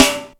Rim sd, Roland MC-303, Snaredrums; Drum Samples

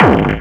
Hard Kick

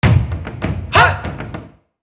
Tribal instant message; Nokia Ringtones; Audio themes

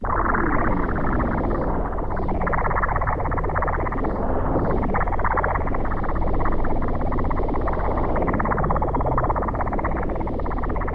Bubbling; Sound FX & Scratches

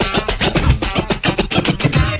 scratch

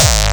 Bombo Hardcore, kicks

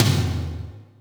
Yamaha RM 50 Toms

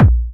Soft, kicks

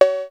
Synth Cymbal, Alesis SR-16

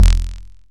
kicks, Bass Drum

